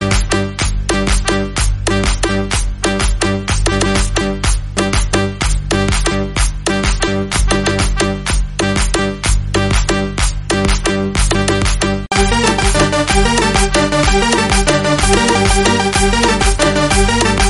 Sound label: movie bgm